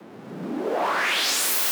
128BPM Noise 1Bar, Clean Noise Ramps; FX Fills; Vengeance Samples

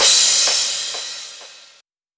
Crash, 140 BPM; Vengeance Samples; Song Kits

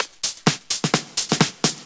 Minimal Fills; Minimal Loops

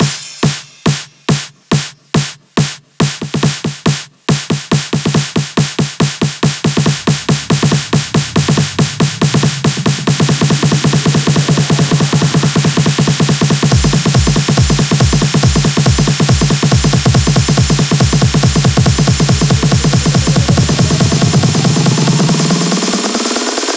140BPM Full 16Bars, Hands Up Trance Fills; Cathlon; Vengeance Samples

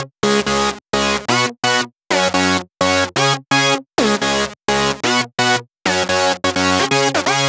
Melody 128 BPM Root C#; Vengeance Samples; Melody Kits

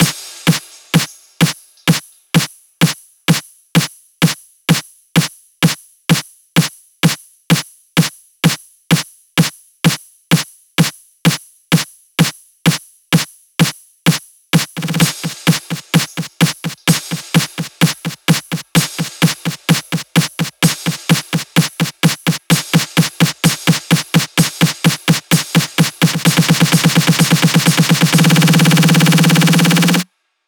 128BPM GoGo Fill, House Electro Fills; 16Bars; Vengeance Samples

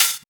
Open Hihats, Cymbals; Vengeance Samples

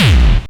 Bassdrums Hard Kicks; Vengeance Samples

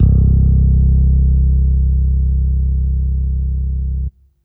Basses C; Vengeance Samples

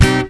Synths C; Vengeance Samples

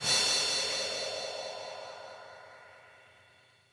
Cymbals Reverse Crash; Vengeance Samples

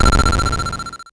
Arcade Riffs

